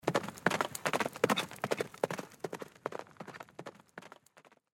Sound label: Horse; Microsoft Studios; Age of Empires III - Complete Collection; cinematics